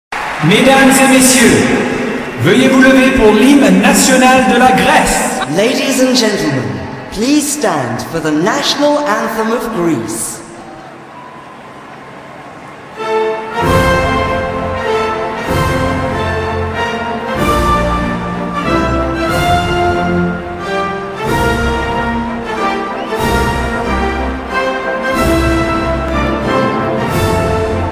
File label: Greek National Anthem; London 2012